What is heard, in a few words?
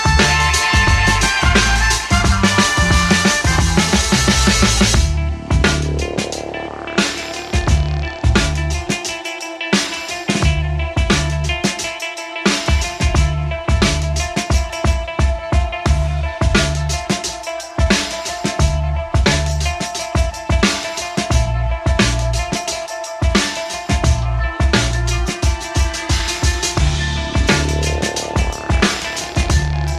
This funk instrumental is super tight and rhythmic